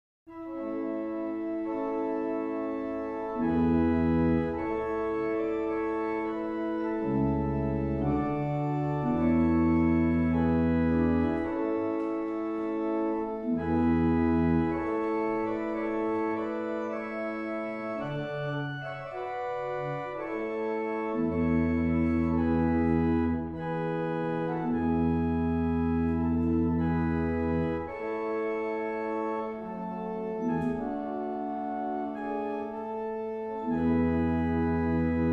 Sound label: barrel organs; Stanstead